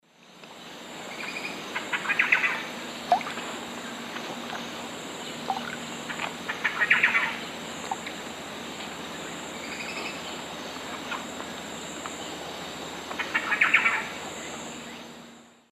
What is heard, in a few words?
Lampropsar tanagrinus, song and calls; S. America; Eastern Lowlands